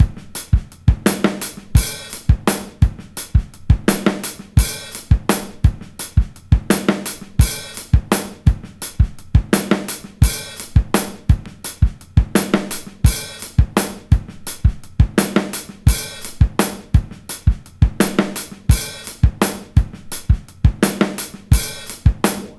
85 bpm, Breaks; BLACKBEAUTY; POTBreaks

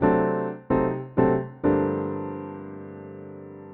Jazz Keys Ac Piano 128 CG, Chordy Loops, 128bpm

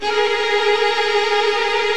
VIO MAJ TRIL

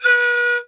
PIPE A#3, REED PIPE; Africa; Spectrasonics; Heart of Africa 1

